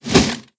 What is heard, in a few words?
Salute; blue archive